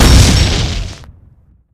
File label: dispenser explode; weapons; dispenserizer; tf2classic